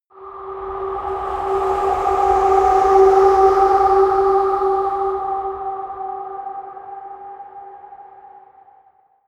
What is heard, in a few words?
air arctic wind, drones